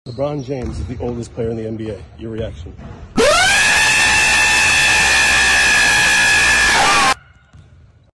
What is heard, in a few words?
loud Screaming; Lebron James; Meme sound effect